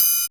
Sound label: HH HH, Dance